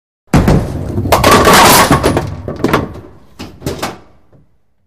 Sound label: Bowling Ball Rolls